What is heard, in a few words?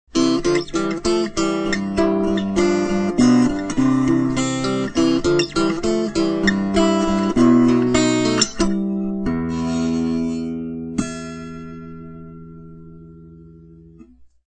basslab silver fing mic, unplugged